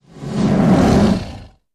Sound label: Animal Sting Growls; stingers & whooshes